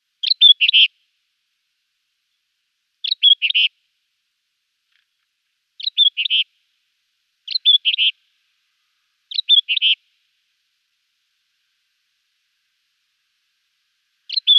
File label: Scrub Warbler song